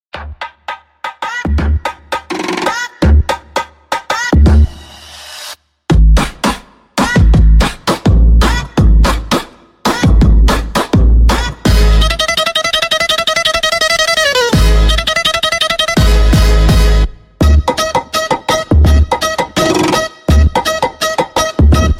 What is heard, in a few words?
Airtel Funk extreme Sped Up